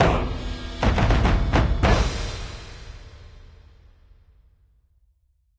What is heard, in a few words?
Win Stinger; runic rampage